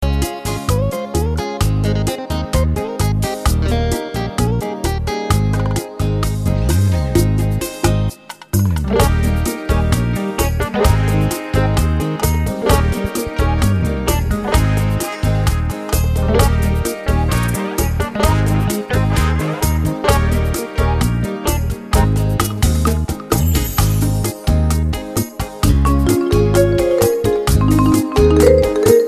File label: Bachata of Love